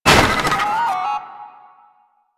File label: Jammer Destroy; Warframe; Nineteeen Ninety Nine; PvPvE